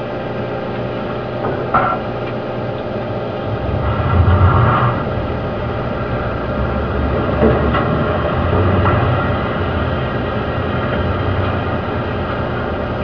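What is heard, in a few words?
engine starting, submarine; snorkeling; The order is given on the 1mc to commence snorkeling; DBF!; Caiman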